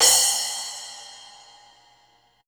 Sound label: CYMB; Houseworx; Drums; Cymbal